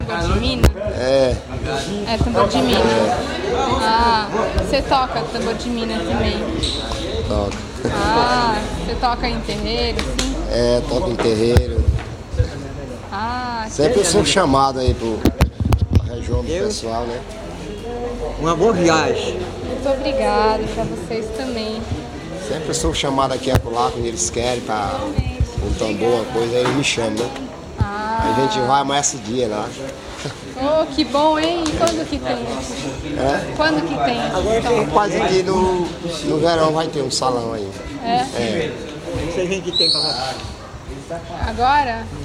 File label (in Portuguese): tambor de mina